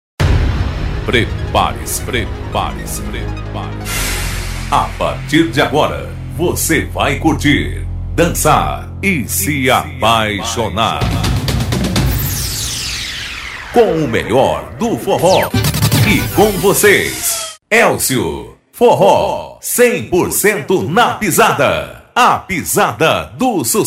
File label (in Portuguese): abertura